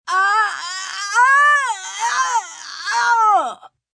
Pain Agony, Pain, agony, female, long, gut-wrenching, moan